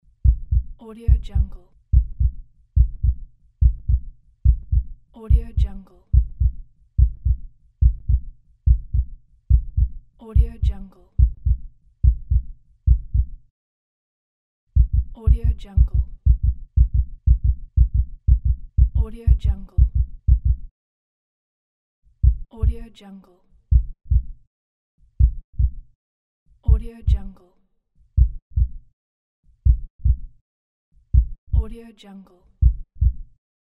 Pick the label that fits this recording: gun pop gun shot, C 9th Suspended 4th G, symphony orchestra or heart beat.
heart beat